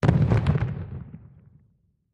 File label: 4 Oz Concussion Hit S